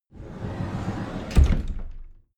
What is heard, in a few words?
cupboard slide door open regular